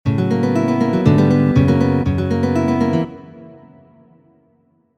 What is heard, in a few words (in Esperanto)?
Preludo 2-a, harmoniikoj